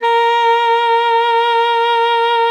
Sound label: A#4, SAXOPHONE; Session Instruments; Best Service ProSamples vol.42